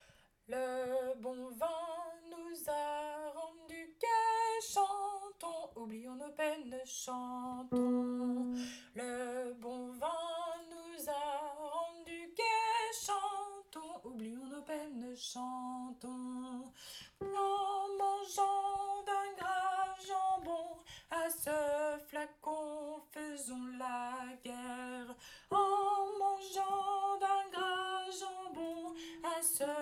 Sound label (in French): Tourdion alto